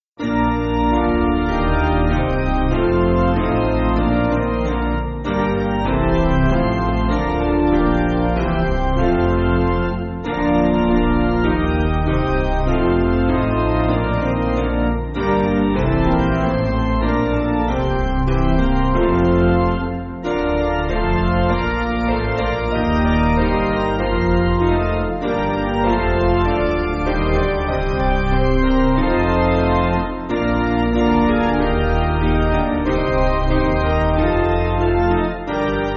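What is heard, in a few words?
Piano, Music Backing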